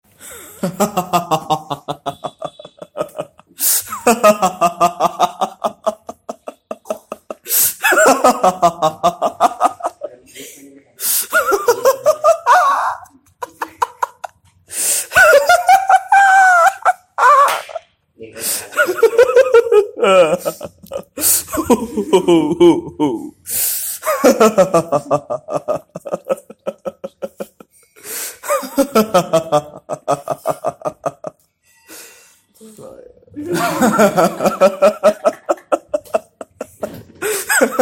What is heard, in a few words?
Laugh, workers laughing alone for money